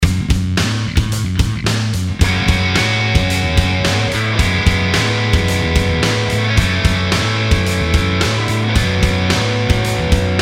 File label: backing